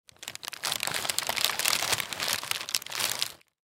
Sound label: Paper Bag Open Crinkle Lunch Packaging Brown; Foley; Blastwave